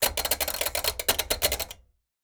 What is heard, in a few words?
Washing Machine Knob, Household